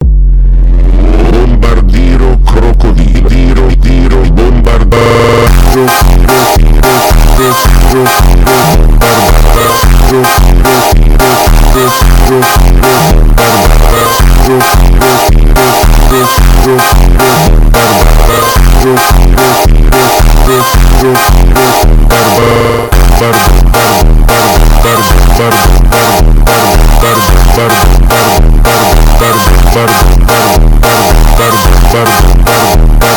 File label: FUNK SLOWED; BOMBARDIRO CROCODILO; Бомбардиро Крокодило мем